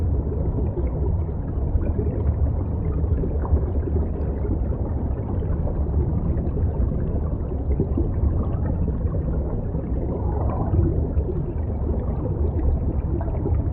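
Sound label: Ambience Underwater Loop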